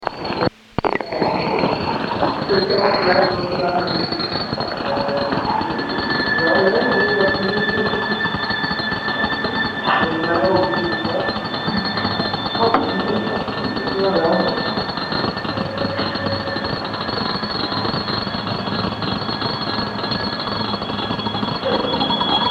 shrine dictorphone mexico, street recording

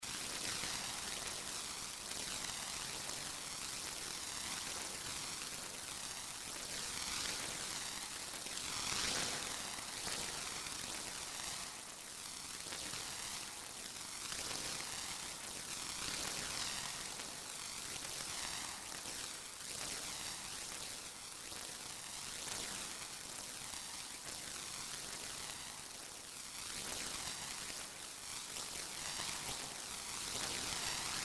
electric gamelan STATIC CARS PASSING

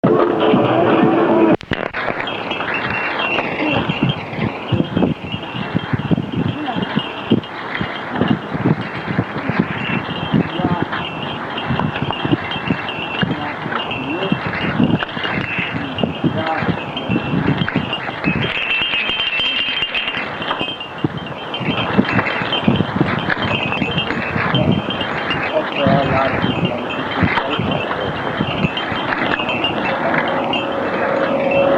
shrine dictorphone mexico, street recording

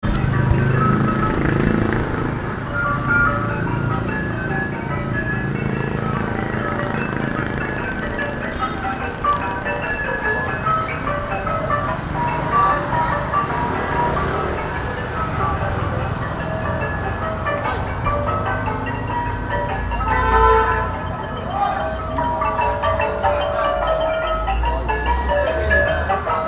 music glocks wedding, Cambodia; 2019; Cambodia phnom penh

